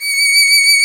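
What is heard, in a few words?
STRINGS SOLO, Chamber Grp; Roland L-CD702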